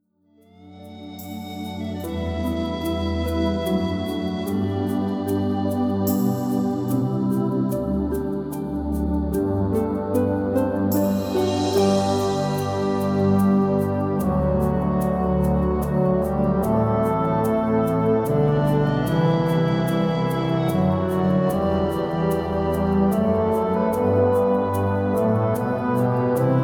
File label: rhythm